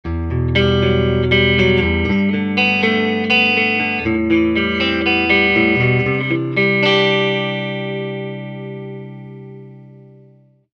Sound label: Fender 59 Bassman Reissue